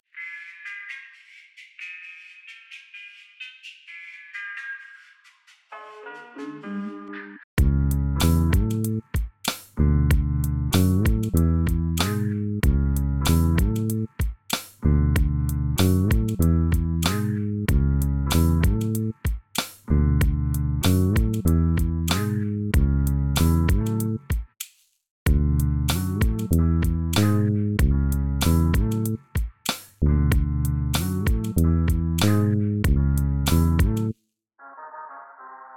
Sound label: Latin HipHop 95bpm